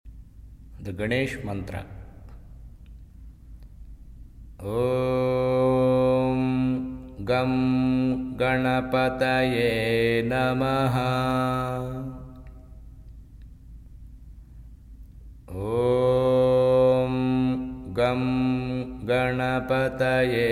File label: Ganesh Mantra